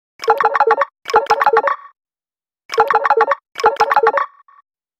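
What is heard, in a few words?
sticks, nothing phone sounds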